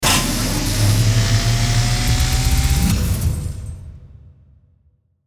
Power Up High Tech Motor Whine Ascend Pneumatic Bursts; Rise And Dive; Blastwave